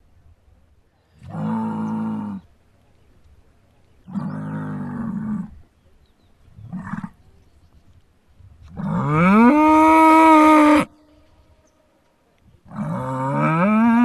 Bull bellow

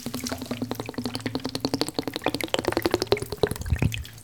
Bottle Pour Out

